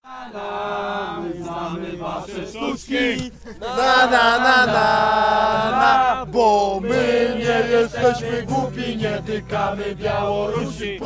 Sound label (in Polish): RAP; spiewnik